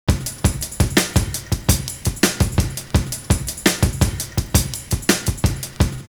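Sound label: serial bass drum, loop; Univers Sons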